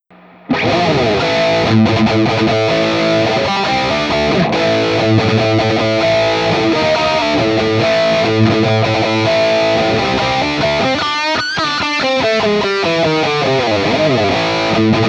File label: Guild X92 Citron; Guild X92 Citron Breakaway from the depths of 1984; Recto Red